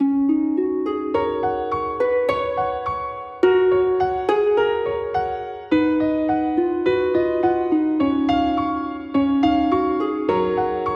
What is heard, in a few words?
Gentle and gorgeous music